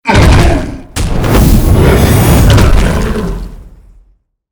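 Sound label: boss death; monster; Final boss; Balierd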